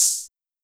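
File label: Hi Hat Open, Korg SR-120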